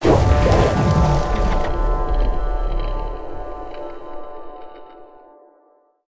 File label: paper boost